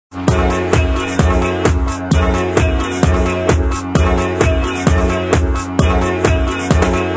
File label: musicbox mini